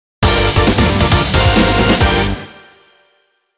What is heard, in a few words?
Gameover; eschatos arrange tracks; Exit Code